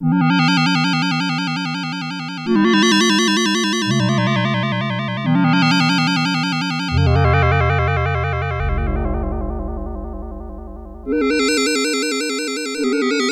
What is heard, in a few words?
SPACE SYNTH I